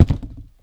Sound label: Box, household rhythm samples Hits